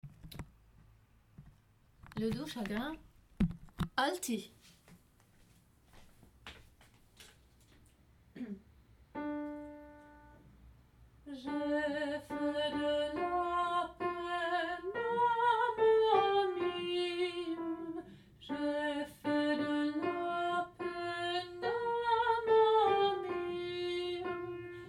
le doux chagrin alti; Alto

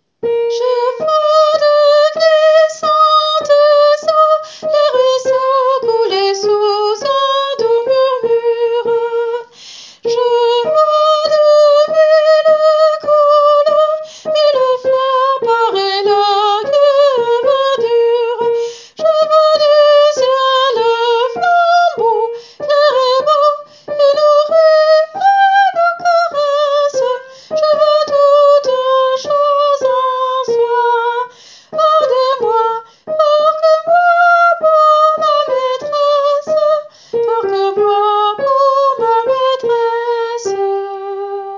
je vois de glissantes eaux tenor

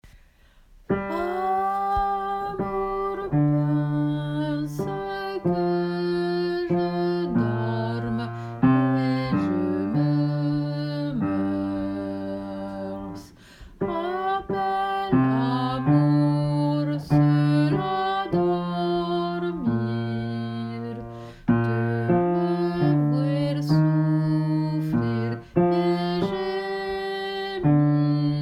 Basse; Amour pense que je dorme

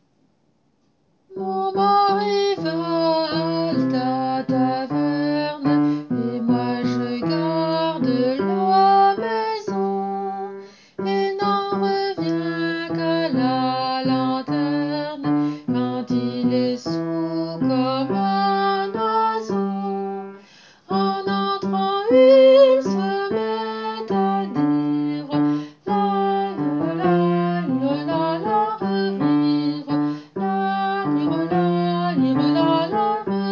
Mon mary basse; Mon mary va à la taverne

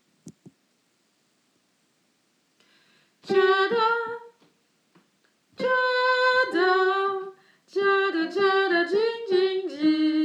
hommes

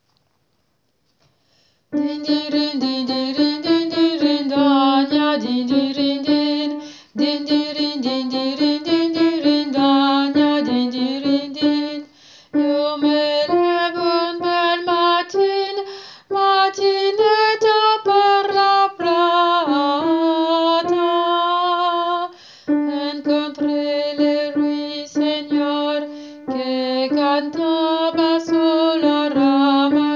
dindiridin alto

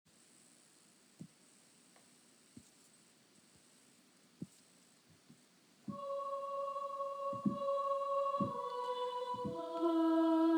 panis tenor; Panis Angelicus

